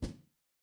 impact punch

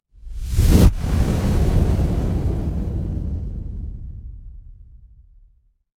fire electric whoosh flame

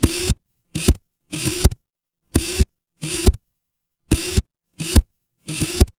mechanical lever

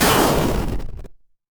retro game explosion

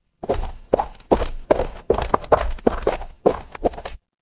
footsteps gravel

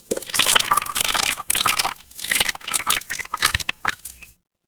water swirl

